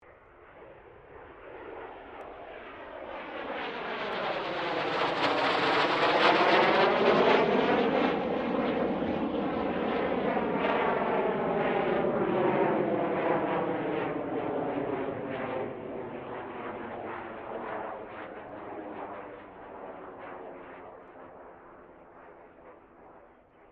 AIRCRAFT CESSNA A-37B DRAGONFLY PASS, Jet Fighter /Bomber; Airplanes; Vehicles